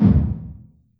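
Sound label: L ROOM Kick 909, TR909; Masterbits - TR-Minator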